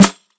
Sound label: treble heavy acoustic snare sound G key